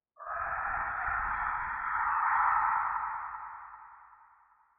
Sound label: Scream